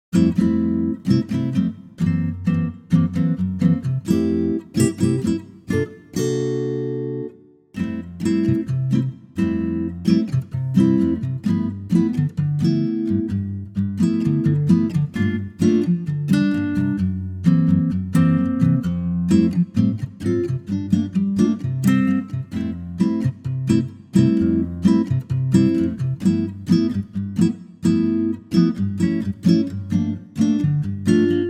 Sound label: Ac Gtr F